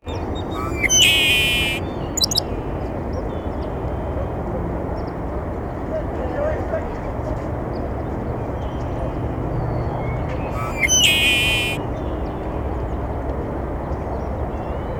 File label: Red winged Blackbird Agelaius phoeniceus gubernator; BC